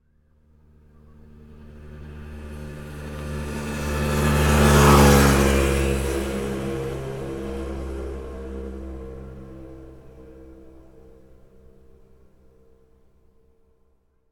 transport motorcycle scooter pass by on road

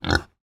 animal pig kunekune grunt

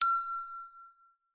bell small hand single ring ping

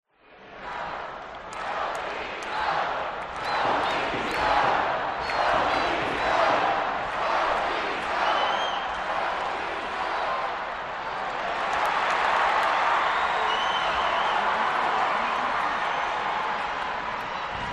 crowd reactions mixed large stadium ice hockey

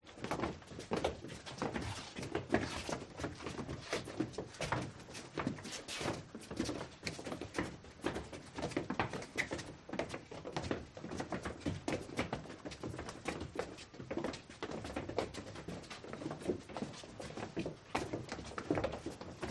FOLEY STEP Crowd Walk Disordered Quiet Sequence; smartsound

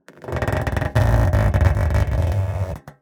Metal Creak; Creaks n Squeaks; soundbits

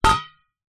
household aerosol can down on wood; zapsplat

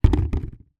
household bucket plastic down on hard floor; zapsplat

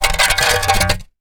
shovel drag on soil; industrial

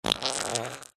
human fart wet dribble poop

